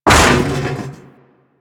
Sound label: Mech Head Impact; Nechro